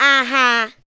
ADLIBS

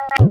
tape fx, scratch; Ambient Grooves

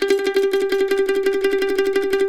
C E trill G, Blues Guitar

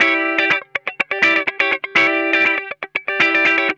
E PEDAL, Funk Guitar; 90 s Sample CDs; Zero G

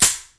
Foley Hi; layering

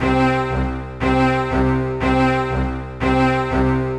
120 C string, Trance, String Loops; Trance Explosion Vol1

